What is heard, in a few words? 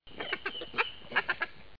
cluck